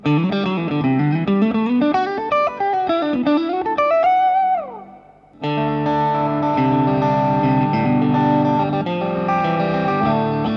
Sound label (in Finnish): kaula ja keski; strat plus